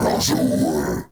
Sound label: Cast Vocal; Warframe; Uriel; Infernalis